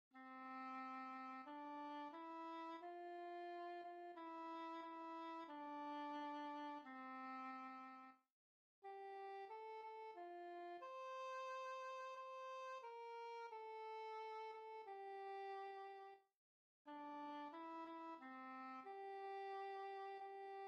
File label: non nobis domine midi t b